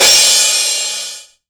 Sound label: crash, Korg Electribe ER-1; Kit 20